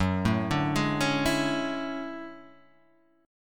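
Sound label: D Major Sharp 11th G flat, chord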